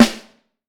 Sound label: Snare Drum; Vintage Snare Drum; 5X14; Q Up Arts; Johnny.C's Vintage Snare Drum